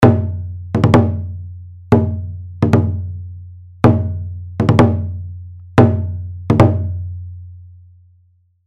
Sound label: Huapenggu; Phoenix Orchestra